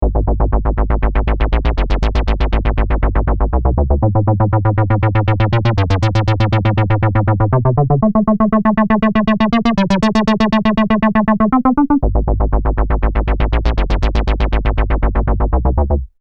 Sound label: synth